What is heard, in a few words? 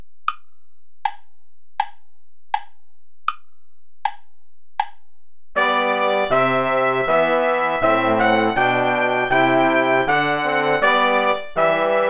play along without trumpet; reger max